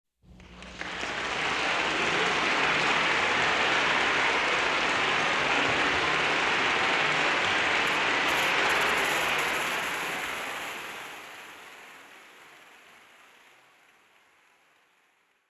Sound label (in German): applaus (großes publikum); Sport